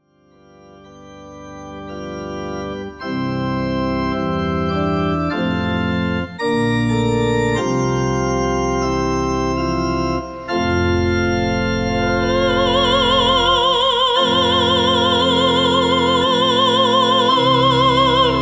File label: soprano